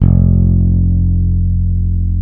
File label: Bass El Full F1; CMI